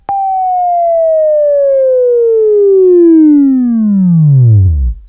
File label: WAV Right, Sweeping; Labview-Program; Sweeping Training program